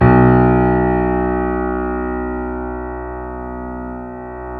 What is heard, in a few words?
KEY, YC7; Roland L-CD701